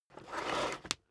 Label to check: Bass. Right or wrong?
Wrong